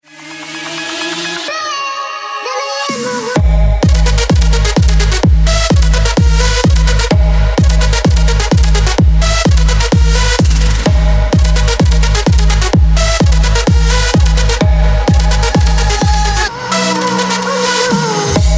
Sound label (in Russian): gromkij moshhnyj klubnjak